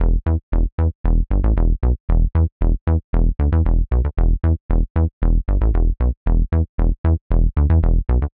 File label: funky disco bass loop 115bpm 4 bars e